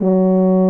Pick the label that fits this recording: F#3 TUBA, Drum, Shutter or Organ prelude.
F#3 TUBA